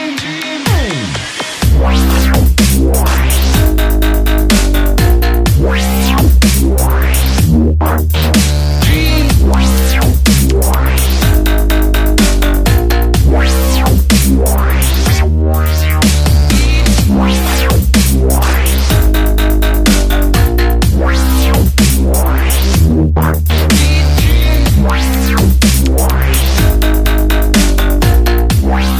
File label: Hybrid Dubstep Remix